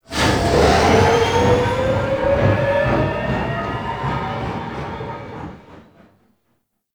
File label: Crew Ship Accelerate, Engines; Warframe; RailJack; Grineer Crew Ship